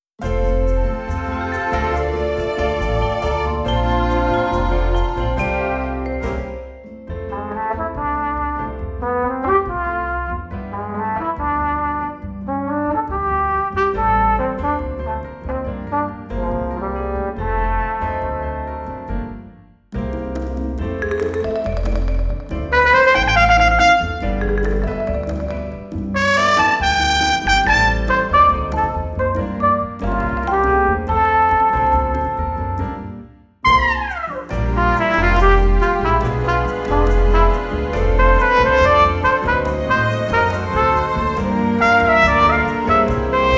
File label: Flugel Bossa; Kaufmann